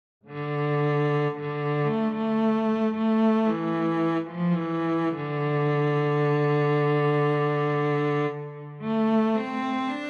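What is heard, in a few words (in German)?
solo Scarborough Fair Violoncello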